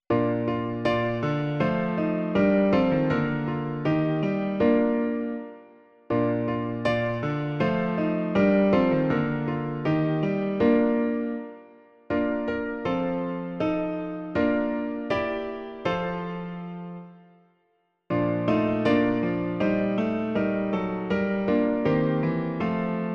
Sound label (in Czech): klavír; Bože velký, jehož zákon svatý; Klavírní verze